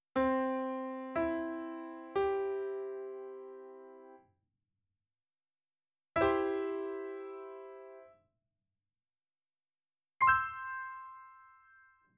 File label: Piano